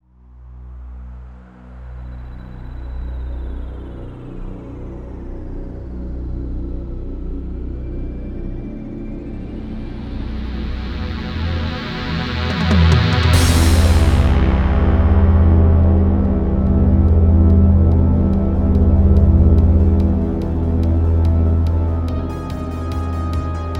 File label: old machines